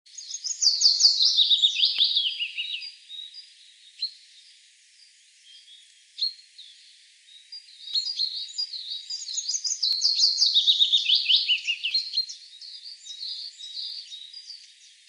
Fitis; Ornithologie

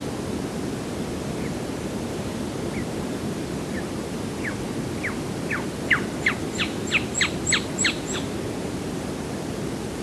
Sperlingskauz; Ornithologie

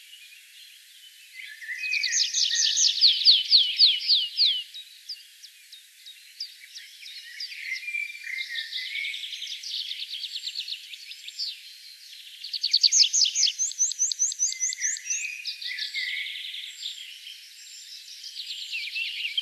Baumpieper; Ornithologie

